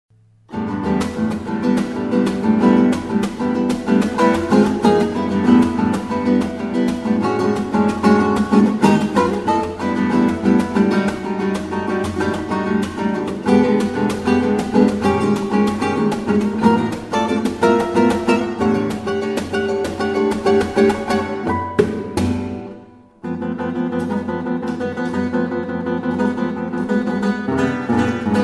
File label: GUITAR SONATA; GINASTERA